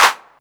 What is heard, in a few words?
Clap, retro house samples, Drum Hits